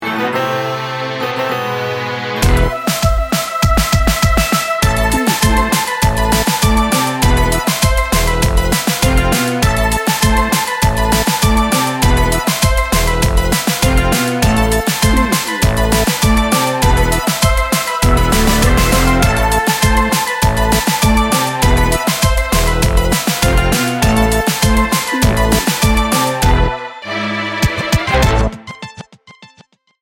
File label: one more reggaeton